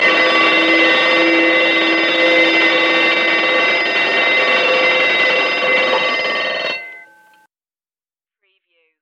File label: Old Gnarly Alarm Bell